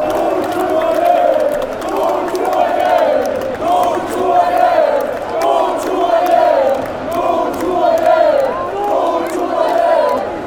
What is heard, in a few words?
randers chant